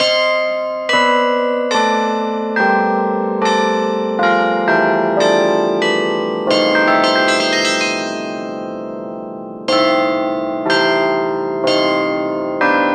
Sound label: TrueCast, carillon